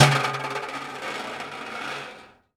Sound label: Prepared Drums